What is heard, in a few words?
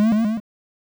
extra butter pickup